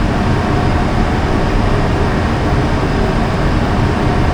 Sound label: machine work; Quake 4 Alpha; id software